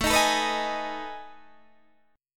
B 13th A, chord

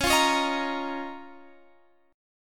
C sharp 6th Add 9th C sharp down; C#6add9; strummed

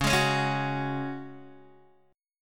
Db Suspended 4th, Guitar, Open D

